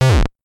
Damaged; Open Surge

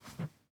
tools sledge; teardown ps5 windows xbox series xs gamerip 2022

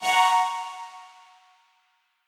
Quasar Clear B; Mixolumia

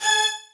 Beat

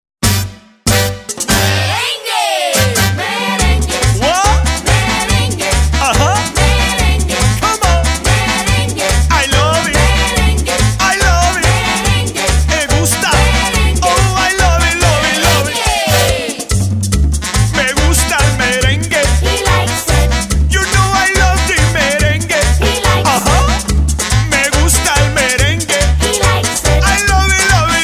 Merengue; Nick Jr Radio

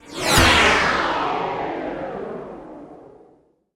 Sfx Ui Objective fail; underworld ascendant

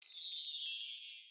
object fly

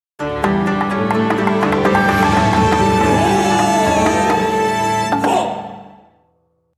National Anthem; Advance Wars 1+2 Re-Boot Camp; Gold Comet

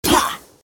Magic; Troll Face Quest Unlucky; Android

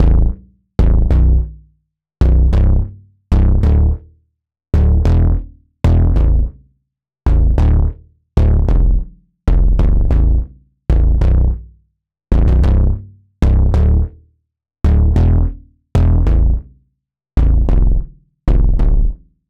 Poly Bass

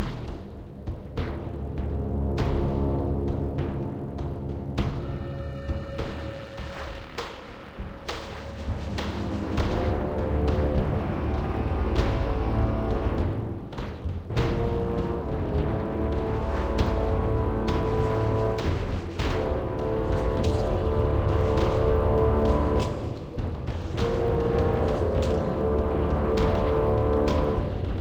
ambient music; Epic of Kings

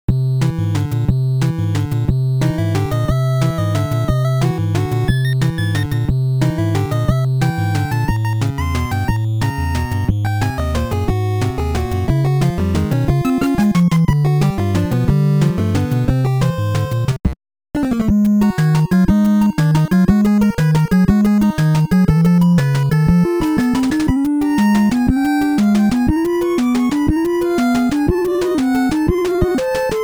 Water Cha Cha; Stage 3; Castlevania Anniversary Collection

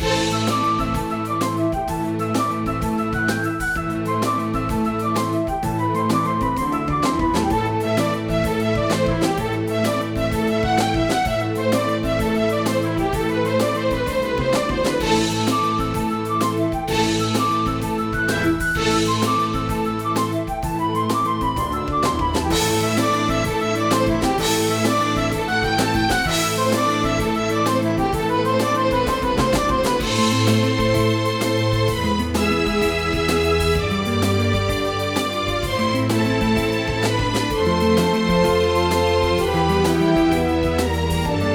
Forest Combat Loop; Erius Forest; Curse of the Sea Rats

